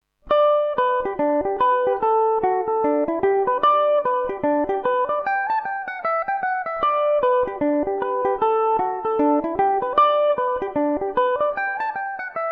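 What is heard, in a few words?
G major revelation, Irish mandolin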